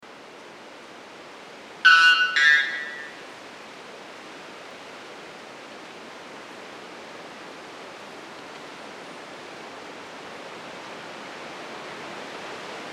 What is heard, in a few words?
white bellbird; پرنده بلبرد شکم سفید